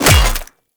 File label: Melee Hammer Impact Flesh; Hammers